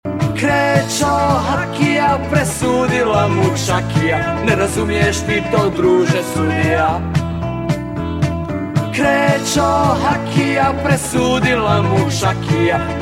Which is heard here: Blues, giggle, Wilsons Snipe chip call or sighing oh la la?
Blues